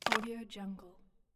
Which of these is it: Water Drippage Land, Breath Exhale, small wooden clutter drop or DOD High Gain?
small wooden clutter drop